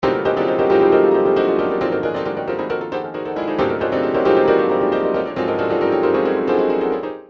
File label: trance midi loops chords; newtronic trance constuction kit